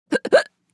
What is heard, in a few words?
Woman hiccup short female